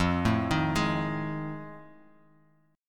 F 7th Sharp 9th, Bass Standard; F7#9 arpeggiated

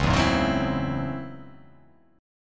Db Minor Major 11th Db; strummed; JGuitar

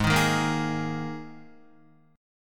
G sharp Suspended 2nd, Guitar; G#sus2; strummed; 3,6,3,3,3,4; 4F,4A,5C,5G,5C,6E

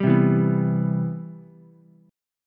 C Major 7th, C, down, Ukulele; strummed; CM7

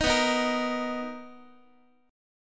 B Major 7th B, Guitar; strummed

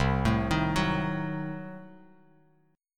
C-Diminished 7th, Bass Standard, chord; arpeggiated; JGuitar

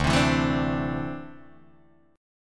D 7th Suspended 2nd down Guitar Open D

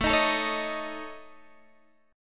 A Major Flat 5th A Guitar Open D, chord

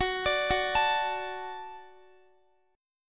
Gb Minor Sharp 5th Gb Guitar; Gbm#5; arpeggiated; x,x,16,19,18,16

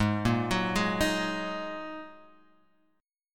G sharp 7th Suspended 2nd, Guitar, Half Step Down

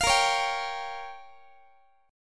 Bb Minor 6th, Guitar; Bbm6 strummed

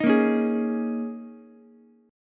Ab Suspended 2nd Suspended 4th Ab down Ukulele; strummed; JGuitar

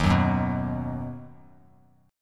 Eb Minor Sharp 5th Bass Standard; Ebm#5 strummed; JGuitar

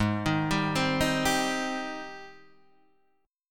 Ab Major 7th Ab down Guitar DADGAD

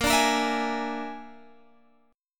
A sharp 7th Flat 5th A sharp, Guitar Open G

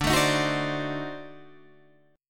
D 7th Flat 9th D down Guitar Open D

